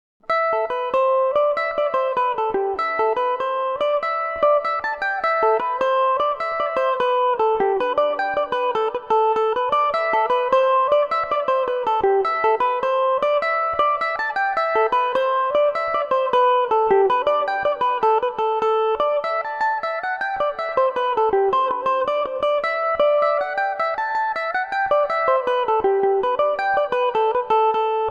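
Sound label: A dorian revelation, Irish mandolin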